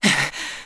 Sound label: Stamina Lowest; Skyward Sword; zelda